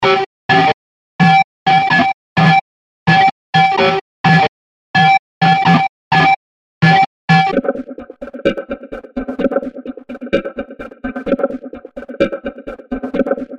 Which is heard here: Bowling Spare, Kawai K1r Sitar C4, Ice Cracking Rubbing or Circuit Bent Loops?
Circuit Bent Loops